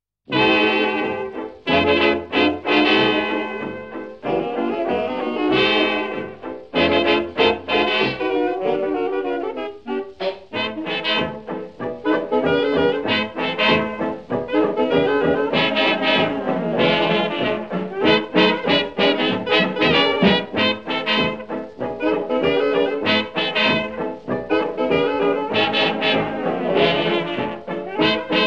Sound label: Rhythm Club Stomp